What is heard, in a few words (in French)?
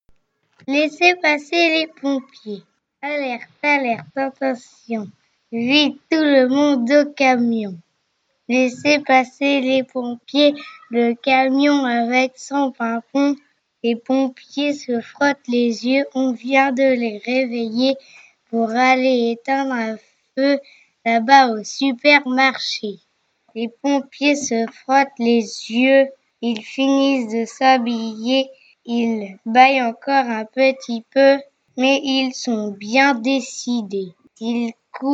laissez passer les pompiers